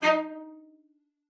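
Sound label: cello spiccato D#5, cello; Xsample VOL-5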